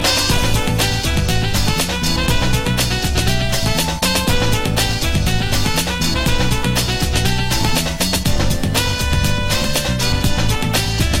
Jazzy

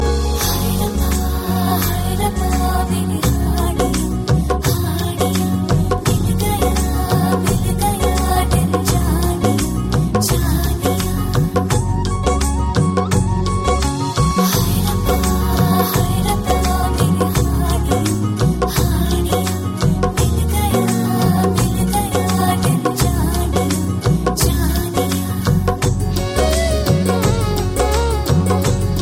Background Song

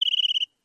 Bewick wren; Bewick wren 2

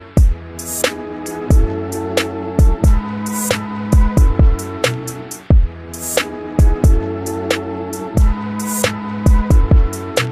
loops

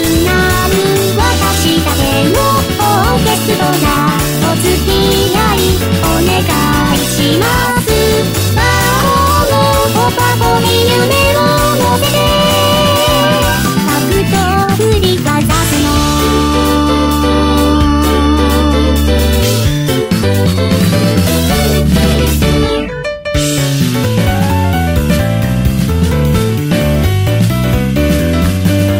Piano Girl

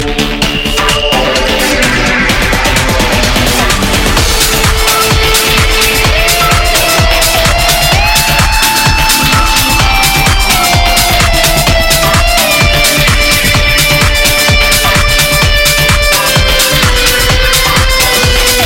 Spacey Club

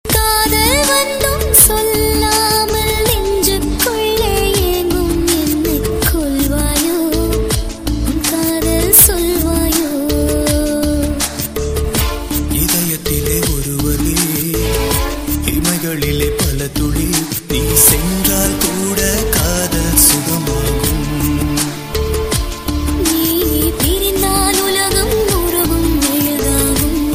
Love Bgm; Saravana

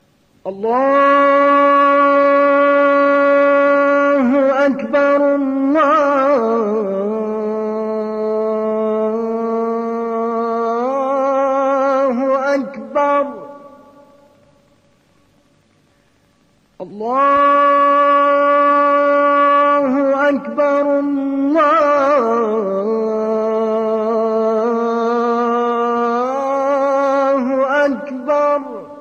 Shia Azan

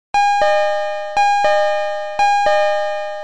Doors Close

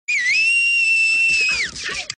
Whistling; Zoozoo

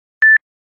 Beep One

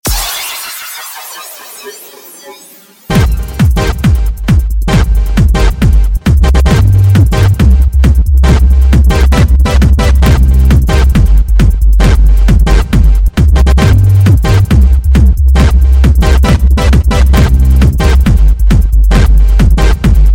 Groove

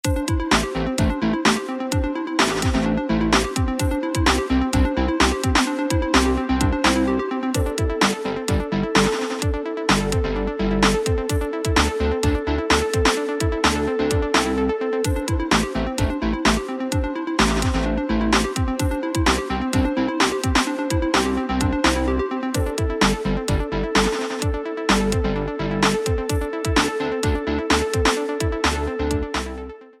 bass

